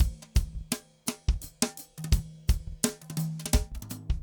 funk rods 170; odd loops

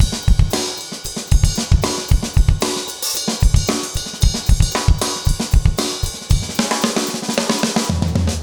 urban grooves 110

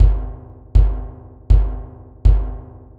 JUNKYARD RHYTHMS

